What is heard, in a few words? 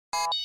Computer Beep High P, beeps